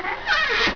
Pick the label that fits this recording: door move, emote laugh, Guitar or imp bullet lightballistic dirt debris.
door move